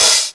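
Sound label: DDM open hat, Korg DDM110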